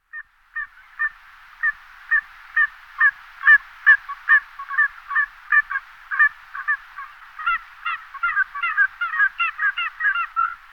Turpan cerny Melanitta nigra, nasiptaci

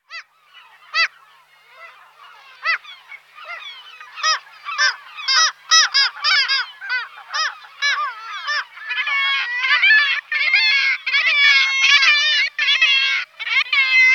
Racek triprsty Rissa tridactyla

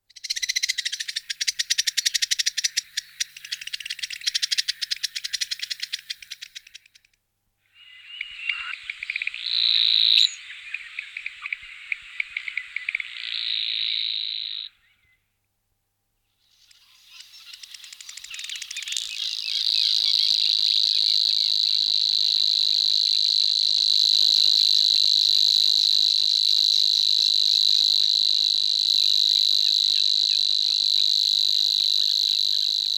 Cvrcilka slavikova Locustella luscinioides

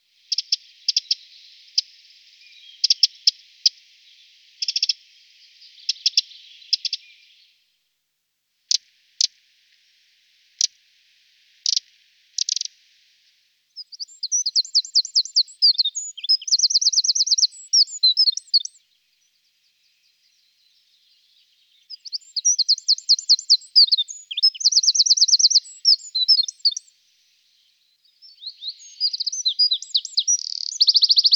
Strizlik obecny Troglodytes troglodytes

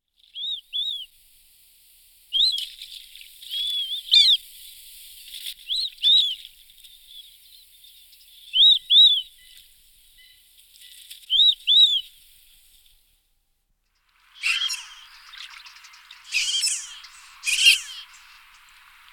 Cirka uzkozoba Anas angustirostris

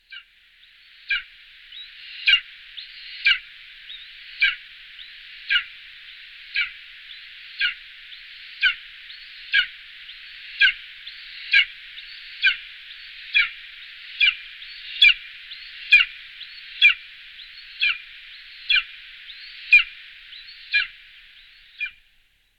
Orel jestrabi Hieraaetus fasciatus

